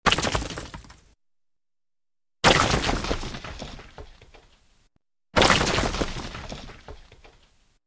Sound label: Big Rock Hits, 3x; rocks